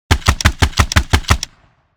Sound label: Slow firing machine gun short burst